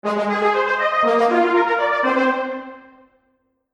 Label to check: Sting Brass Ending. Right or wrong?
Right